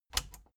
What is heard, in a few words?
plastic button latch scratch enable fast